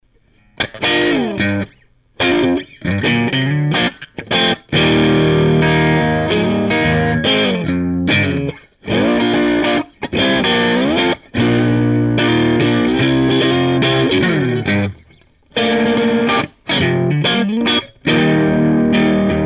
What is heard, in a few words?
strat Half gain; Jet City